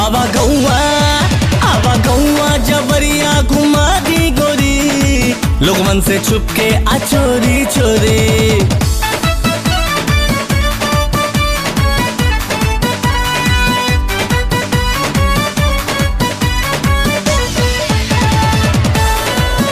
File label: BHOJPURI RINGTONE